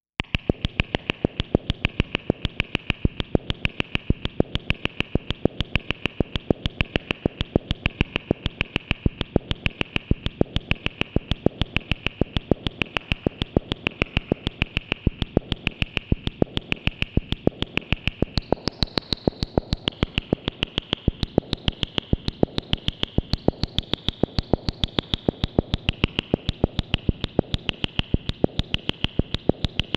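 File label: MOOG MAVIS Mini Rhythm 78 with Stereo Spring Reverb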